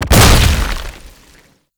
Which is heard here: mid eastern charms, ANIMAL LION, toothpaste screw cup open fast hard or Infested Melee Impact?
Infested Melee Impact